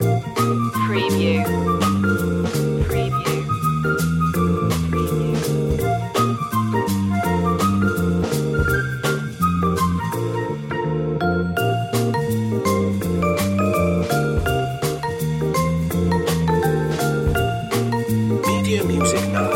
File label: scooter ride stinger